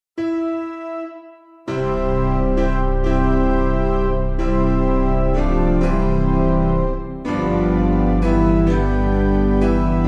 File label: O Piano